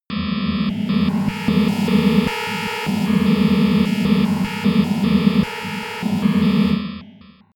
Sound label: atmosphere on off